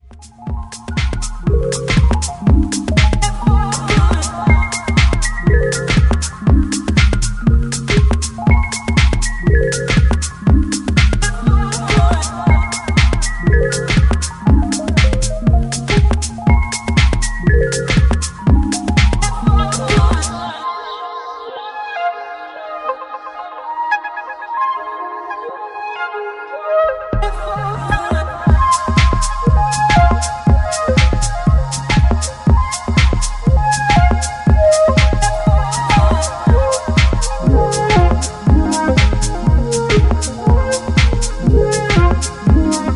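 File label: two bar house music and chord stuff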